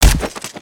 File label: Skullken Hit; creatures organic